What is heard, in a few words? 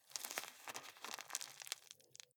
juggle squeeze